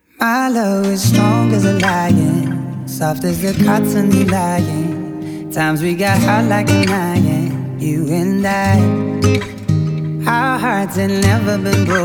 guitar acoustic

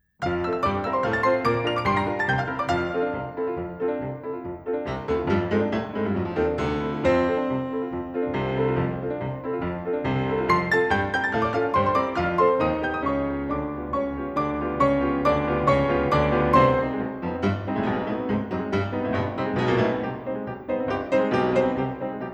for piano 4 hands; Erik Satie; La Belle Excentrique (Fantaisie Serieuse); Grande Ritournelle

